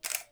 camera

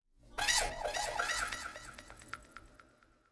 Zipper Tuned C Major Delayed

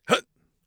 hup, Mage Voice Pack

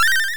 teleport

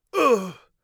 hit, Mage Voice Pack

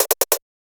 Allert Place; FREE SFX PACK VOL 1

